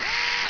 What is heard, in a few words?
deploy, sentry; XDM